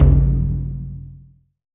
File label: OO DAIKO, taiko; AKAI S-Series CD-ROM Sound Library VOL-5